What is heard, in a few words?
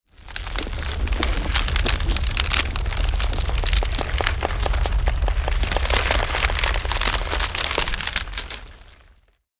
Magical forest growth vegetation nature